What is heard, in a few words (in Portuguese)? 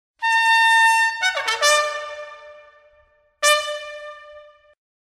Sentido, toques